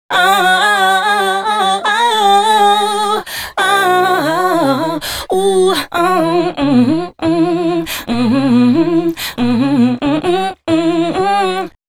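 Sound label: female c# 140 bpm, Dance Ultra Voice, adlibs; Mutekki Media; Dance Ultra Voice VOL-1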